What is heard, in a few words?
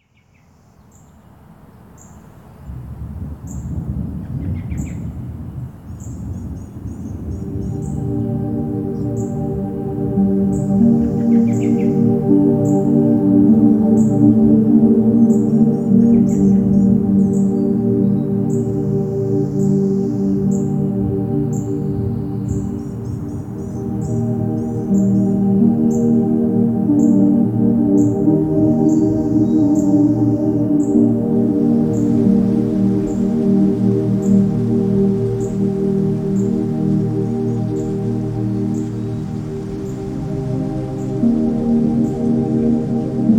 Rainy Sleep, spa nature